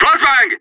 flashbang

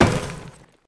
ladder, Players

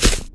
grass, Players

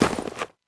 snow, Players

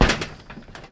grate, Players

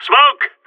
smokegrenade

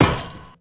ladder, Players

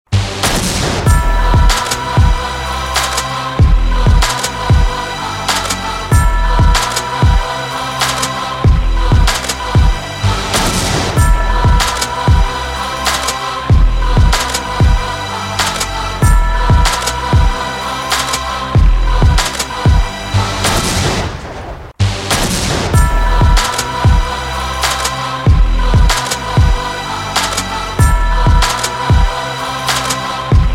loading

